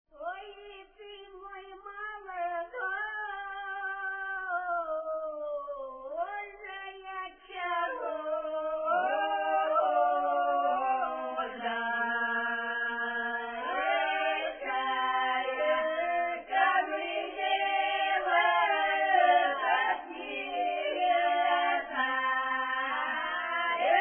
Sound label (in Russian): плясовая, с.Секирино Скопинского р-на; запевает; Эй, стоить кузня